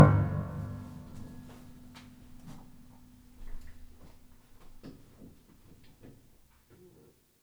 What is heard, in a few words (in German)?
Dreieck Dither